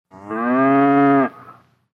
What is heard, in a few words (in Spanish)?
vaca; Tres En Raya